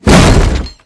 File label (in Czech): dvere otevreni; Mafie 1